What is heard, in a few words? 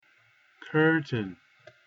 curtain